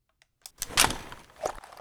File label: Inf Weapons Foley; Red Orchestra Ostfront 41-45